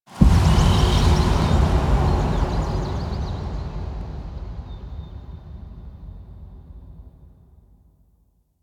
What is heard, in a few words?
Amphitheatre Pre Show Transition Short; Warframe; Duviri